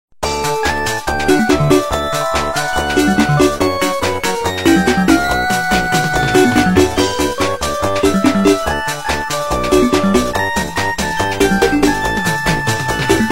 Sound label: Tune